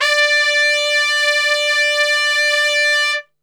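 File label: D 4 piccolo trumpet, Brass; Best Service; Brass Super Section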